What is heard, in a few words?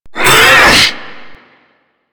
Hammer Boss Exertion, Vocals; Warframe